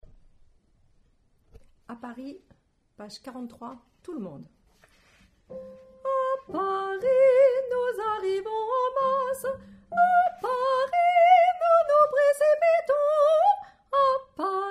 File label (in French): Tutti